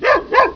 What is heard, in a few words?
alert; hunger; hungerhound